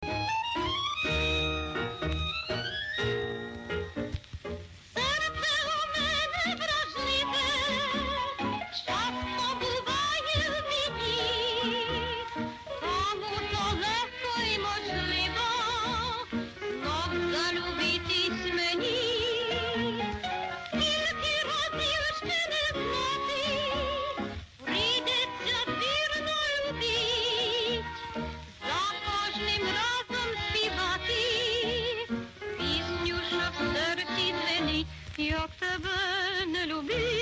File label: Ukrainian tango